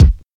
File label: solid kick one shot c key